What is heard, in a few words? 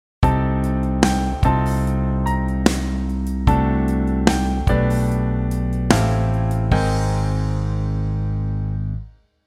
modal interchange